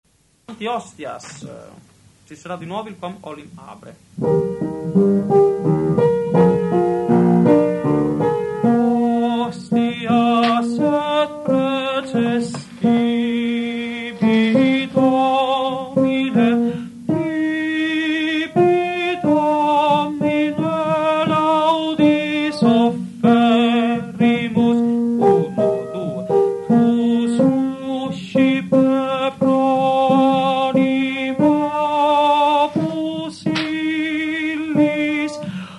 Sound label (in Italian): Requiem Hostias tenori; Mozart